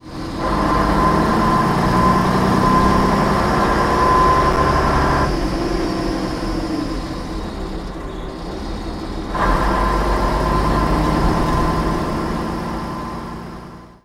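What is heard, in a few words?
leyland tiger, bus; Simutrans; Pak128.Britain-Ex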